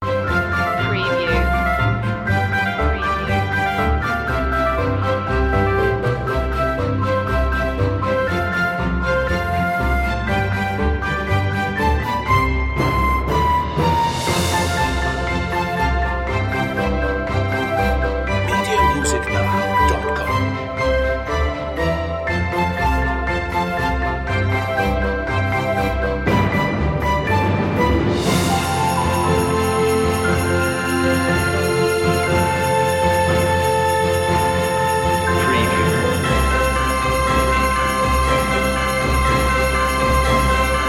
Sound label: no vocal; media music now